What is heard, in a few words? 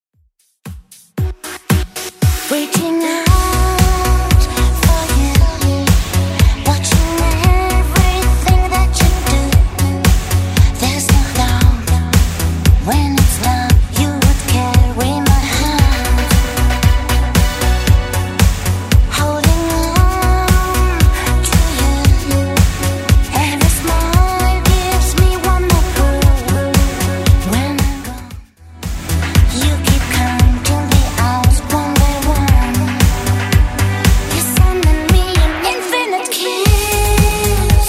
80s Redrum 115bpm